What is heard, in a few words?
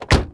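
door close; Mafie 1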